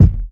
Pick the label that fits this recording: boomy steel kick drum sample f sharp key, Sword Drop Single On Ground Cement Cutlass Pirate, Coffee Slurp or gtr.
boomy steel kick drum sample f sharp key